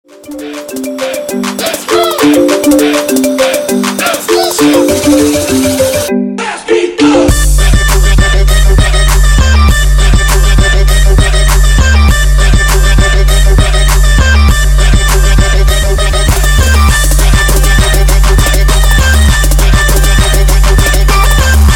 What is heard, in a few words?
Remix; iphone